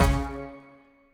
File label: Near Win Reel Bounce; netent